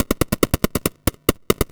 Crushed Perc (140BPM); musicradar

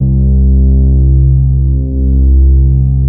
C3, BASS; Q Up Arts; Dream Experience; BASS BANK 5

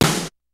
kicks snare, kid; Kid Nepro; Elite; KICKS SNARES

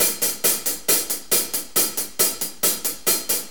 4-4 HiHat Loop; Drum Tools

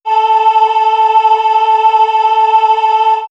Swirls Noize Vox, pads; X-Static Goldmine

